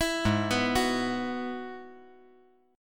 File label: Ab Minor 7th Sharp 5th Ab; arpeggiated; JGuitar